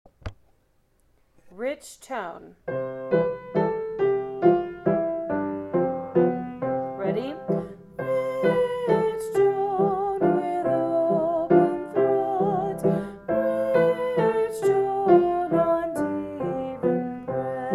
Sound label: rich tone